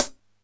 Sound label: Alesis DM5 Hat